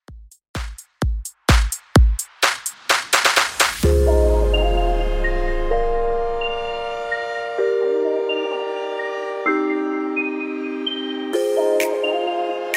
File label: 128 Bpm Clean; Tiktok Redrum